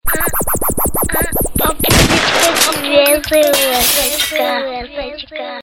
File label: SMS